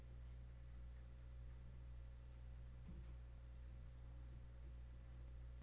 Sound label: spoon